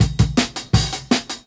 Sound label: Jungle Breaks